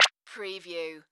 Multimedia Alert

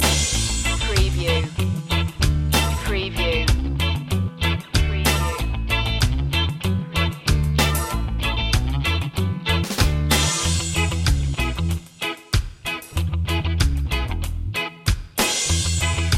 rock steady loop

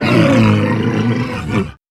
creature vocal

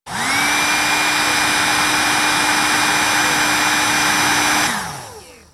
Heat Gun Strong Long

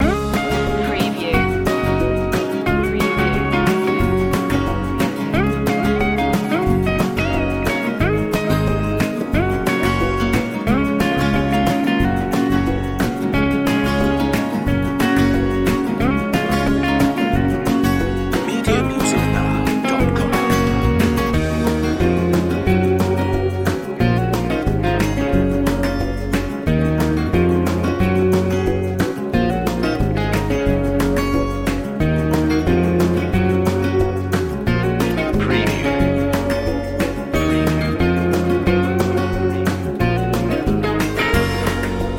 country road trip loop

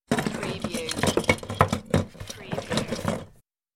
Box of Christmas decorations being moved; beanstalk audio

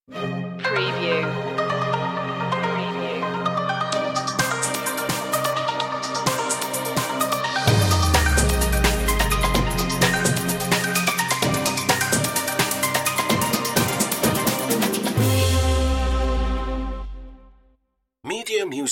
liquid breaks stinger

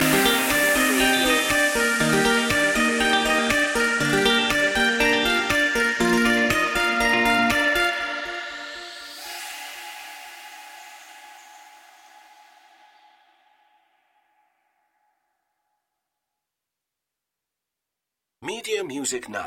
funky life loop end no vocals; media music now

